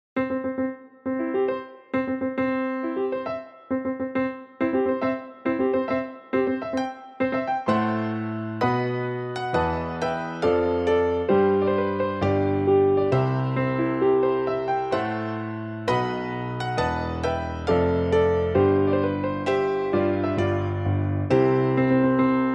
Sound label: Bridal Chorus, Piano